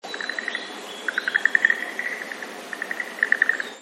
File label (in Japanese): kaeru